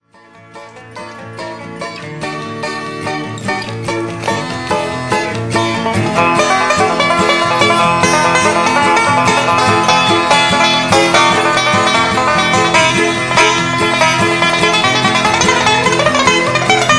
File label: Banjo Solo